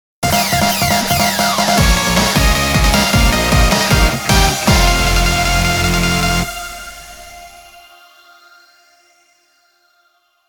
Turbine Loop

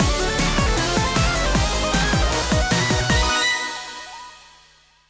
Jingle A; Sonic & All-Stars Racing Transformed - Original Sound Version

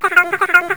Voice Cat; Princess & Conquest v0.20.06

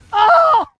WAH; Princess & Conquest v0.20.06

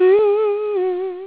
sing; Insaniquarium; popcap

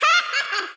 laugh; Princess & Conquest v0.20.06

